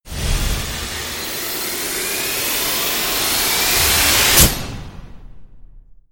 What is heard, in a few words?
rise to hit; supercell